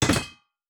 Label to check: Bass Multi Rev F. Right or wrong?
Wrong